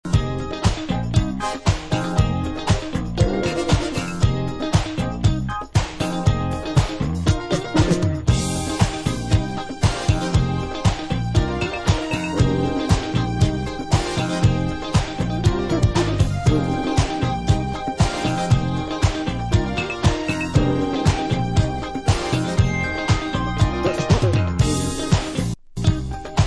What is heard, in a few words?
Instrumental